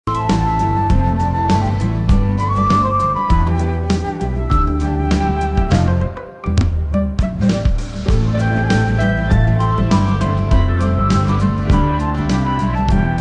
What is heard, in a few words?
optimistic melody; AI Test Kitchen